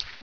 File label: raptor footstep